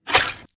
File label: Bloodbank Spawn